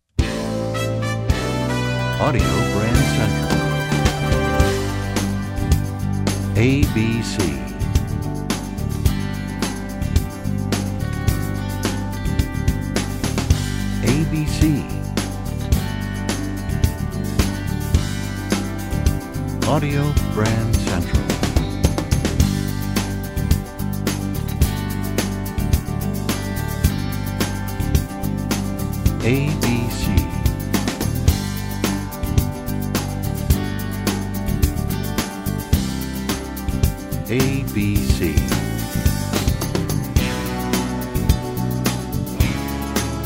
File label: Desert Wind, radio jingles